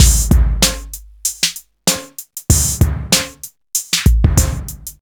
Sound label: 96 bpm drum beat A key; drum loop